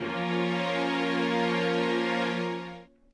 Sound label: chord